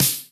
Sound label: Snare Acoustic, drums; One Shots; Crystal